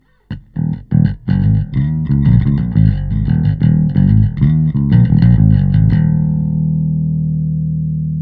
bass AMP; Joe Chiccarelli

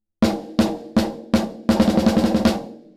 Spring damp high 100

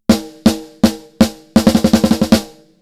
Snare Brightner; Oxygen

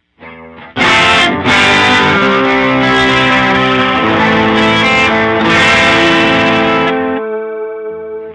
Flanger; Moogerfooger MF-108S; Flanger Danger

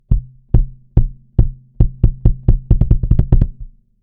kick; Joe Chiccarelli

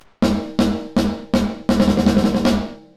Envelope Decay Sustain Hold; Reverberate3

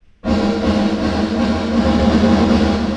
LATE REVERB ECHOES

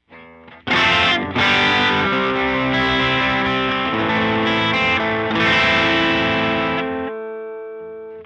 guitar; SKYE Clipper

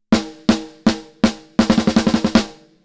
snare; smart EQ 3

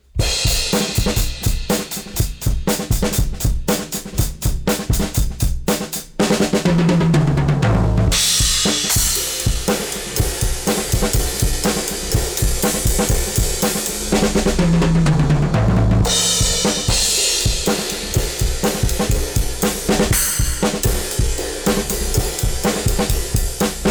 drums Break

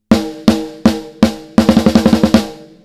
Snare

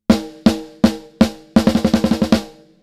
snare; AMEK 9099

